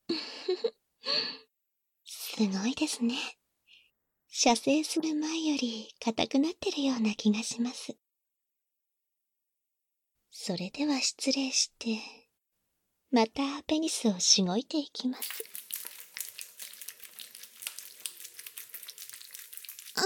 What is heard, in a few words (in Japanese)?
耳オイルマッサージ, バイノーラルマイク収録 囁き重視編集 効果音あり大きめ; 効果音大差分; 耳オイルマッサージ+手コキ